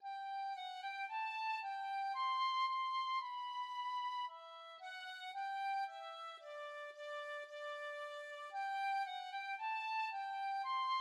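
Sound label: penny whistle, tin whistle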